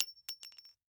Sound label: bullet casing pistol drop on cement